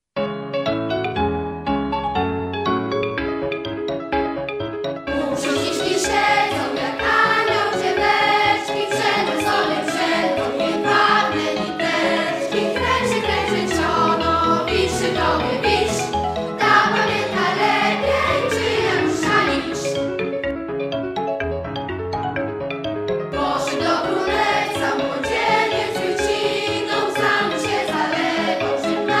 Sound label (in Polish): PRZĄŚNICZKA CHÓR cis moll